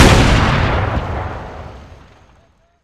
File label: m67 explode; garrysmod